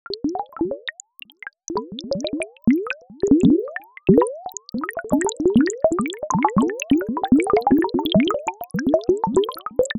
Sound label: huge drops